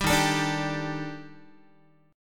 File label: Eb Major Sharp 11th Eb Guitar; EbM#11; strummed; 10,11,12,12,11,x; JGuitar